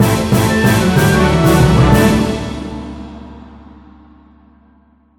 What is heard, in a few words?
Celebrate Stinger Short; Disney Infinity